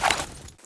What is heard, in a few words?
draw pistol